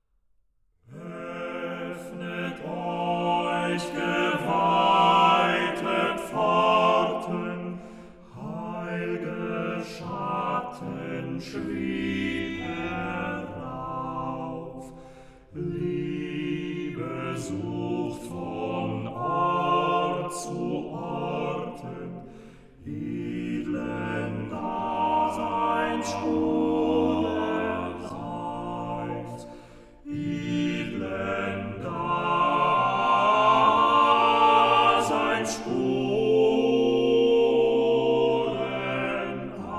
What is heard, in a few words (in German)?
Weihgesang